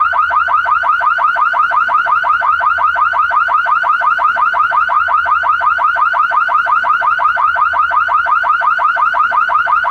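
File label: sounds of a car alarm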